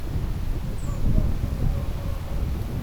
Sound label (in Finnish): toyhtotiainen ilmeisestikin